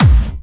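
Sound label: BD Clubby, bass drum; VEC1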